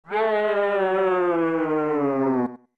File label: Shout